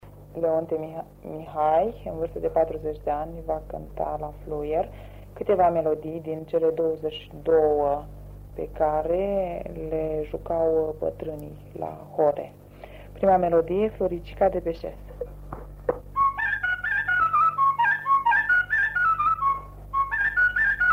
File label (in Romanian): Floricica de pe ses la fluier cu strigatura; jocuri si cantece batranesti; Vaslui